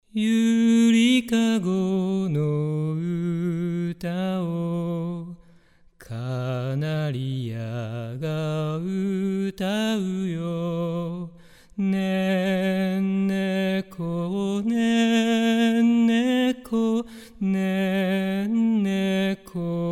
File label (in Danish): japan sang